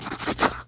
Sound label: scratch out; crowds; NotDotTeam